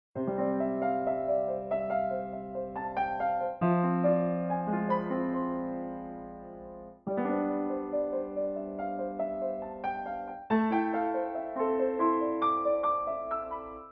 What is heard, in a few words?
Piano